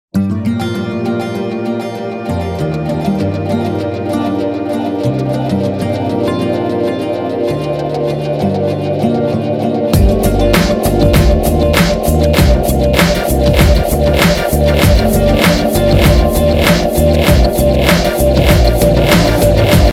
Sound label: Melody, RingTune